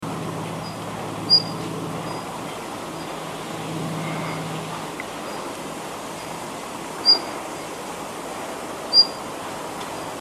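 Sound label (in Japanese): jyoubi, 野鳥の声; ジョウビタキ; 冬の訪れを知らせるとてもきれいな野鳥です。自転車のブレーキのような鳴き声が特徴的。; 尾っぽで地面を叩く時の音が火打ち石に似ていることから名前が付いたそうです。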